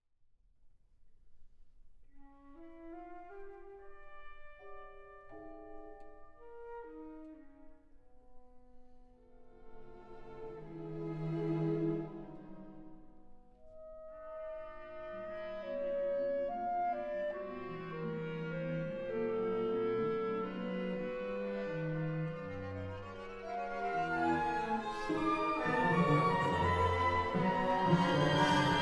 etwas bewegte achtel stucke fur orchester

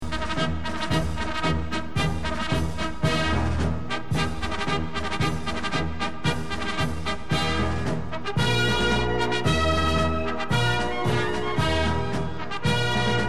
militarmarsche kaiser wilhelm marsch; deutsche militarmarsche

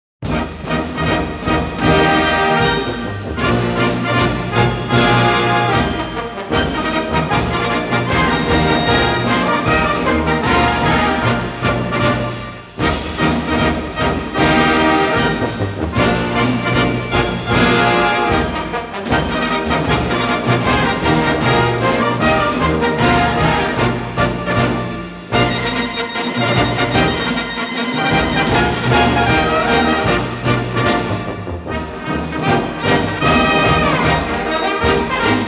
militarmarsche, Luftwaffen Musik Korps; deutsche militarmarsche; preubischer prasentiermarsch

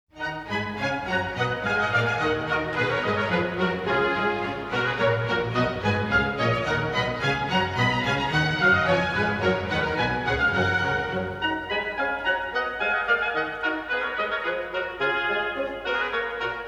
orchesterkonzert d dur bourree allegro

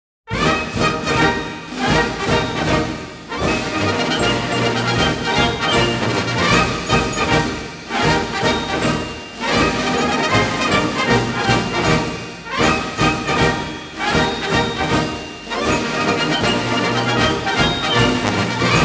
bayrischer prasentiermarsch, militarmarsche, luftwaffen musik korps; deutsche militarmarsche

